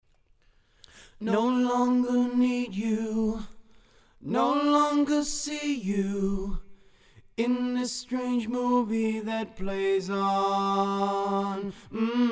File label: Vox Solo